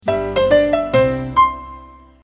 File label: piano, phone aussie